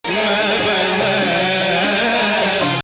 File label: Bayati Bayati